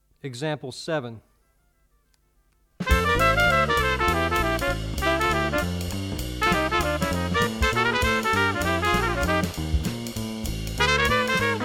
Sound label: two horn audio examples